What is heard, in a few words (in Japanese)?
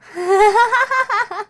笑い